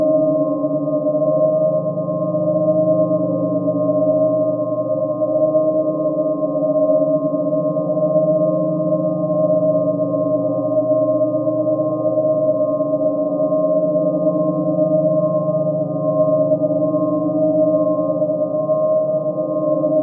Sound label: xen crystal radiation hum; mecha titan catnap